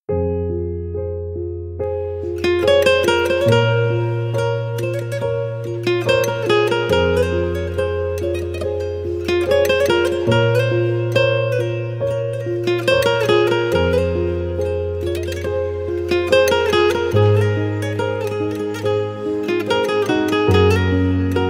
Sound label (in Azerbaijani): gitar da super ifa fon musiqi